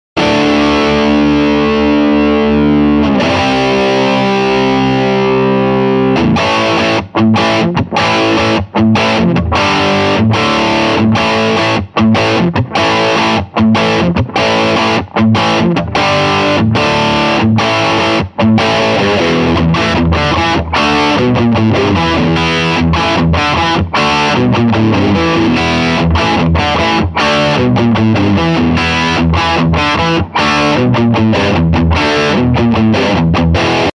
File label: rock guitar sound